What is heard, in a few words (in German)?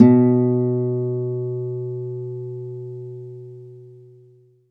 KONZERTGIT, Alpine Volksmusik